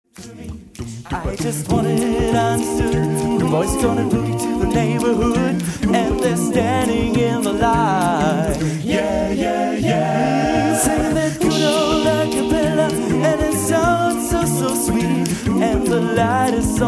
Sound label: Acappella